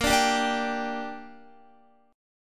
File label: A sharp 6th, Guitar; A#6; strummed